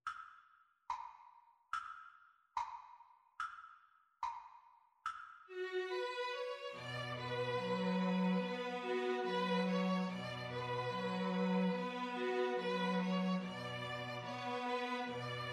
bella ciao, 2 Violins Cello

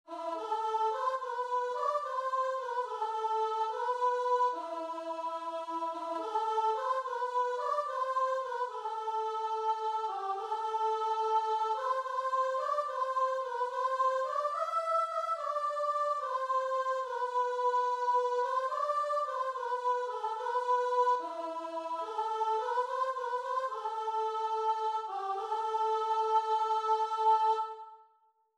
gabriels message, guitar and vocal

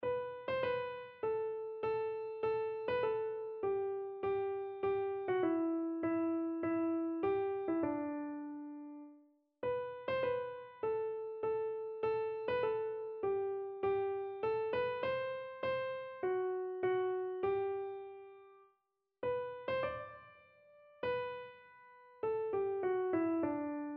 i am thine, keyboard

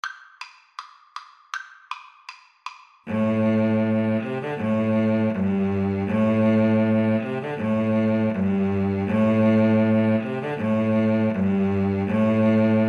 Flute Cello Duet; drowsy maggie

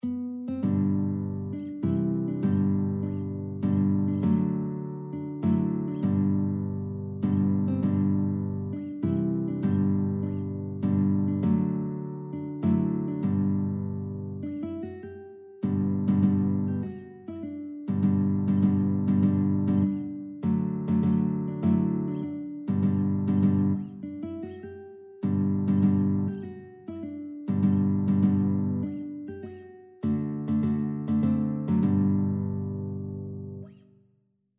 i will sing guitar

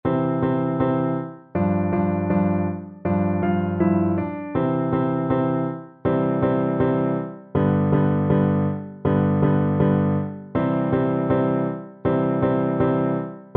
kakke kakke FL kar, Flute

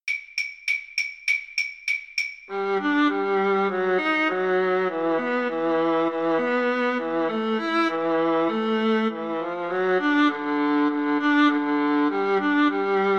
rag VLN VLA duet, Violin Viola Duet

